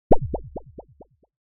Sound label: Cartoon bounce; boing